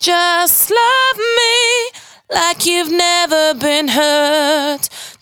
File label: 92bpm Bb, Velvet Vocal; Zero-G